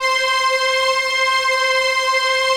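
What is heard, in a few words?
PAD, atmospheric pads; Best Service; XX Large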